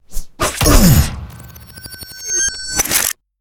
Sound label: buzzer